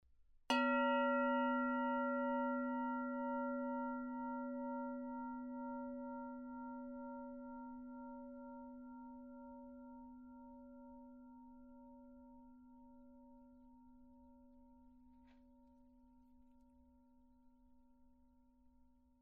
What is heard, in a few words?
low medium, aluphone